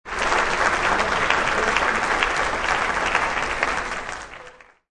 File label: Large banquet crowd applauses.; Applause Sound Effects; Human